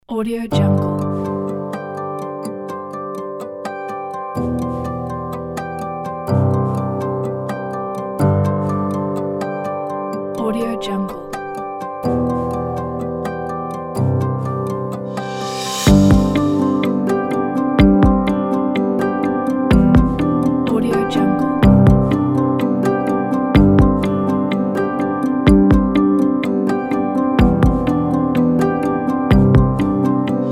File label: beautiful inspiring cinematic